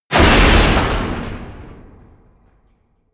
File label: smash, baugewerbe